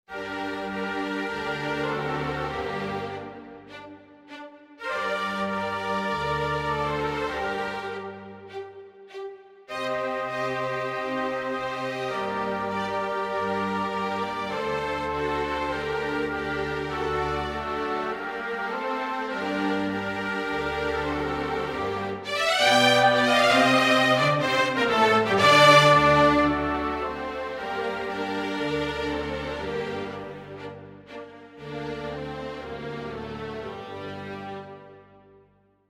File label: ballet-pantomime, MIDI version; ninette a la cour; STOCKHOLM VERSION